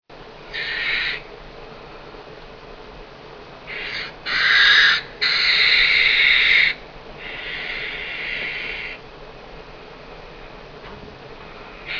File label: brushtail possum